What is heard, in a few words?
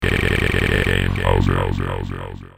game over